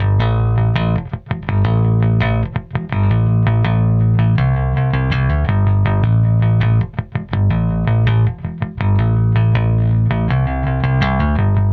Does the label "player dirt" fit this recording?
No